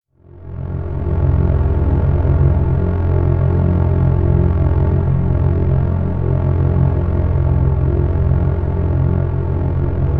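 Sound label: spatial hum space humming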